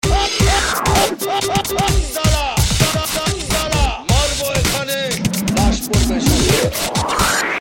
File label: Dubstep